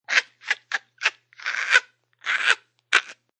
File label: Old Lady Laugh, A female laughs; Laughter; Voice